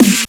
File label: Snare Dubstep; pitchglitch